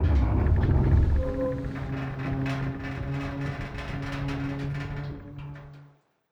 door large metal open start; Rust

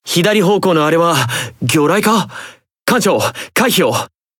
Alarms Torpedo Left

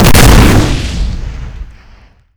detpack main; Explosions; Call of Duty Modern Warfare

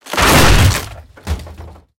tree break

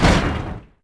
Tank Hit Short; Civ4 warlords

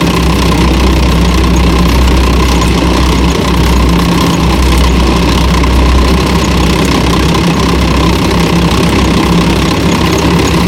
tracks light speed, tanks; engines; War Thunder

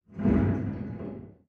damage hits explosions, Warships

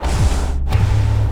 biomech idle breath fast

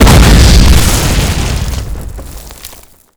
mortar dirt; Explosions

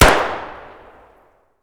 grach fire outdoor distant; Escape from Tarkov

